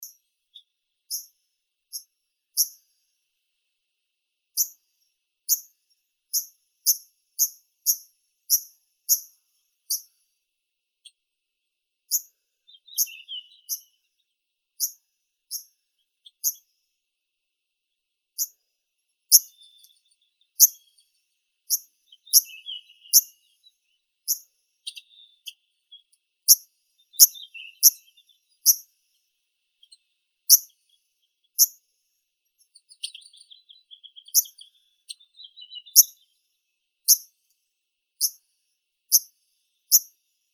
penochka talovka phylloscopus borealis; zvuki prirodi

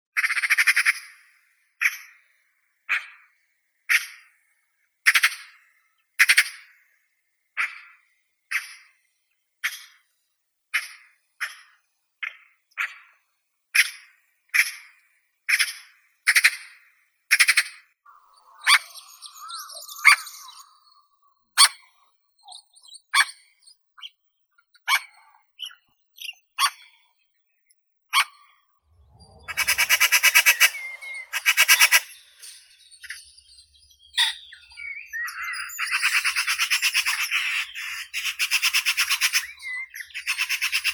soroka pica pica, zvuki prirodi

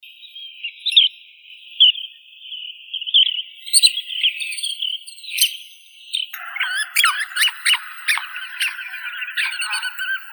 pesochnik krasnosheika calidris ruficollis

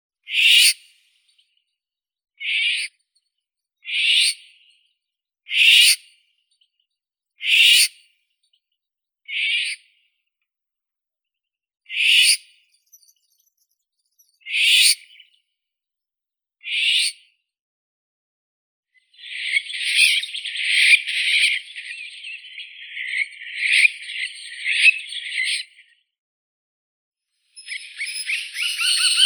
ivolga obiknovennaya oriolus oriolus

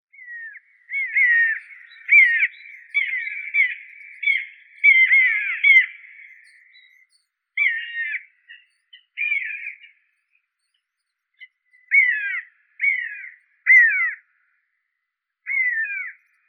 lun bolotnii circus aeruginosus

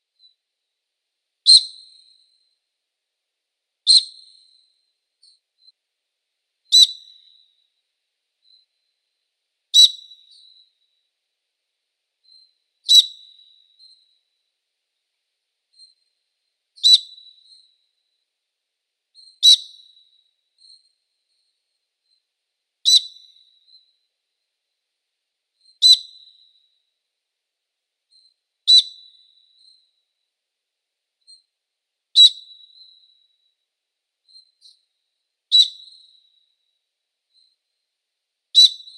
sich mohnonogii aegolius funereus sovyata, zvuki prirodi

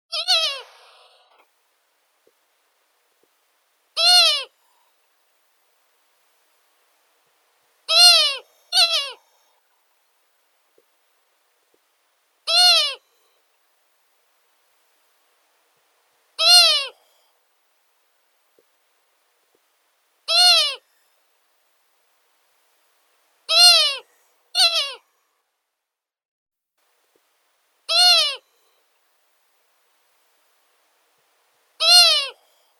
zvuki prirodi sova ushastaya asio otus devochka

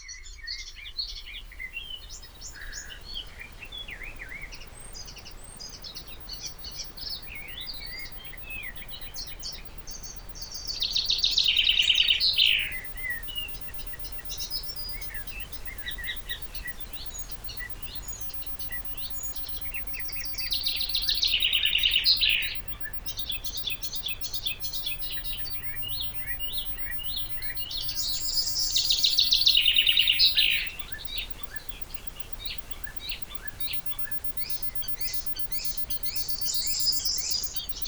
zvuki prirodi u lesa na opushke

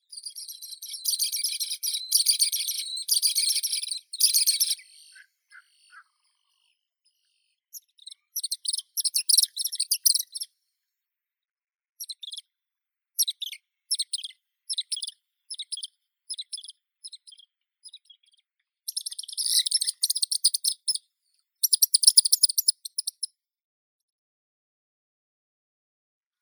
zimorodok malii pegii ceryle rudis; zvuki prirodi

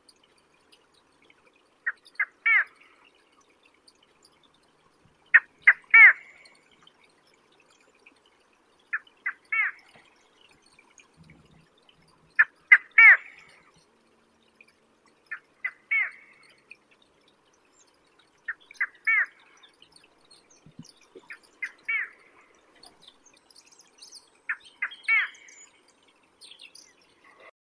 bekas otshelnik gallinago solitaria

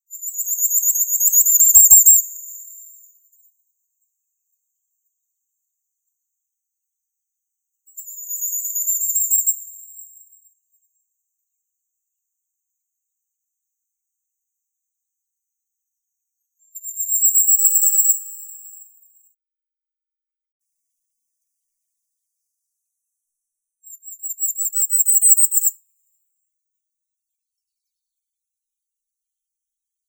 korolek krasnogolovii regulus ignicapillus

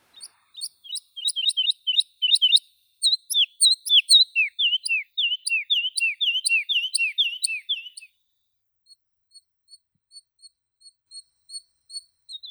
zhavoronok lesnoi lullula arborea, zvuki prirodi

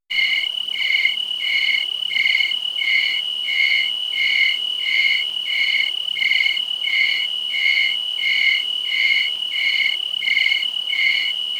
kontsert sverchkov

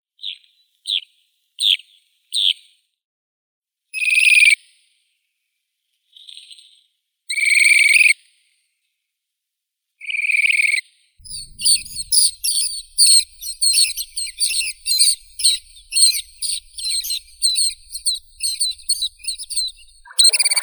pesochnik pereponchatopalii calidris mauri; zvuki prirodi

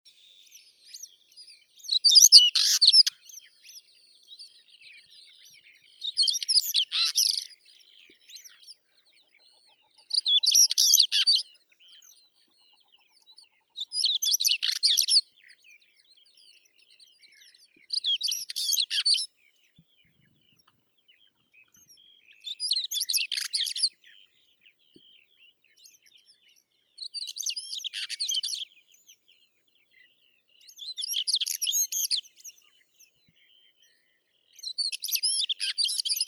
chekan sibirskii saxicola maurus

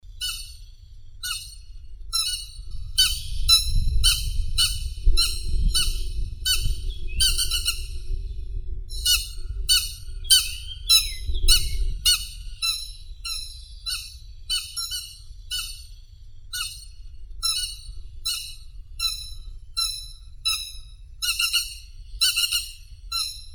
chibis serii vanellus cinereus

